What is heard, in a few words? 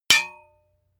hammer hit metal dull